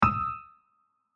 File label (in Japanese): ミステリー・不穏な気付きの効果音